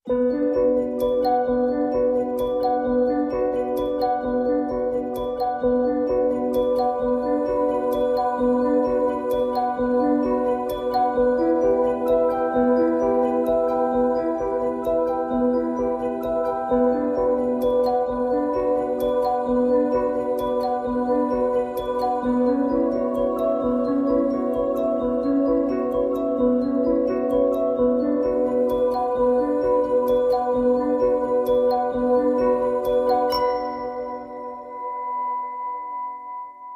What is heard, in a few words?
Handbells B